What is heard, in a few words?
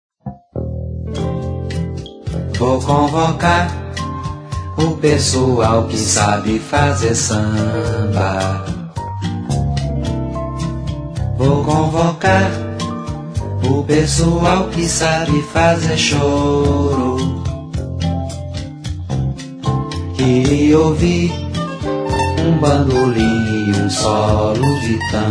Samba choro